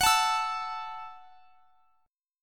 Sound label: F sharp Minor 7th, guitar; F#m7 strummed; x,x,x,23,22,24